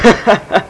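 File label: laugh; People; Sound Library 2000; Wayzata Technology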